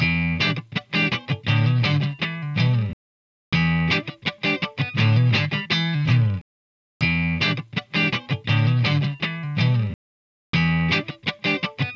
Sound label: listening exp lacis sound